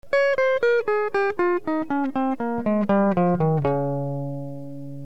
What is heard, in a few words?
D Lydian Clean, Delay